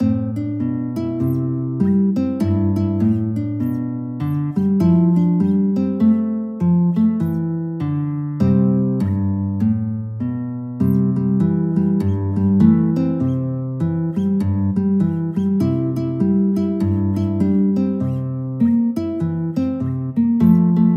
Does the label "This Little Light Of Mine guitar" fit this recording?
Yes